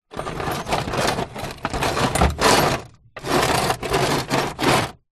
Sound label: industrial metal tools in metal tool box movements rummage; zapsplat